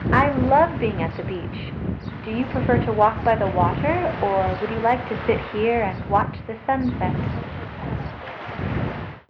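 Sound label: Wind Noise Traditional hearing aid; Hearing Health Info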